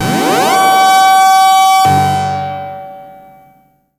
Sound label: organ synth; InVision Interactive; Keith Emerson; The Most Dangerous Synth and Organ